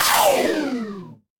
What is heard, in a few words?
retro game laser